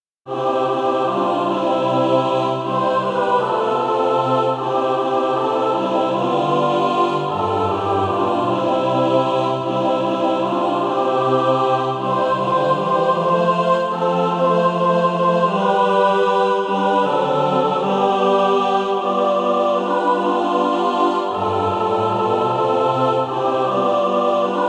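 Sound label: mixed, Practise Music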